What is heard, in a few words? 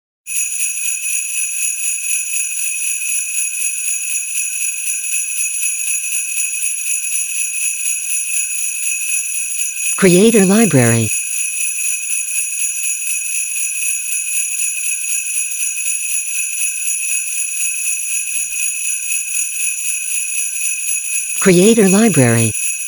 Christmas Bells